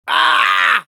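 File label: Demoman pain severe, Spanish; Grito 1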